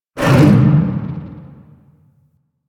Fishing Gulp; Duviri Maw Fishing

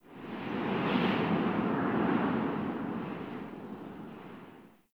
Wind Heavy Fast Gust; Ambience; Dungeons; Under City

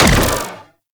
Swarm Missile Fire; DE; Warframe; Weapons; Sentinel; Helstrum

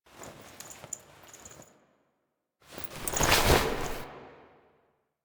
Foley A; Drifter Lotus Operator Awakes

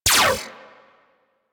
Shot, Weapons; Warframe; Moa Pet; Multron

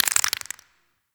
Infested Burst Rifle Mech, weapons

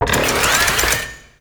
Melee Slam Charge; Warframe; Necramech

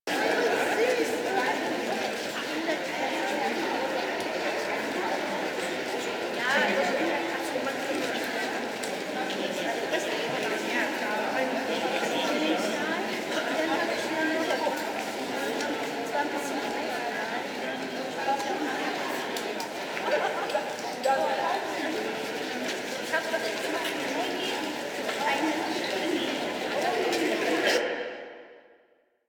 Village Walla Loop; Ostron; Warframe; Ambience; Eidolon Plains

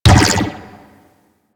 Ball Bounce Dome Energy; Warframe; Missions; Tenno Ball

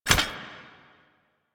Footstep Default; Warframe; Warframes; Hoplite

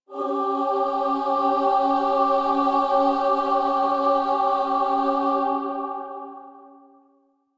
Requiem Mod Choir; Warframe; Kuva Lich

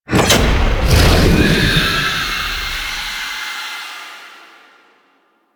Gargoyle Activation Stinger; Entrati Labs

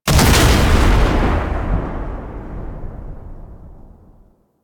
Alchemy Vent Explosion; Warframe; Entrati

